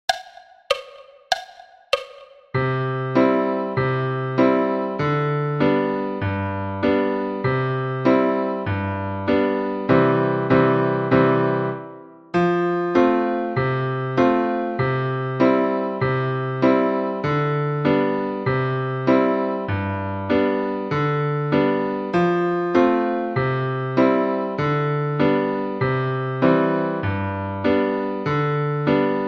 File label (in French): Polka piano a 98 bpm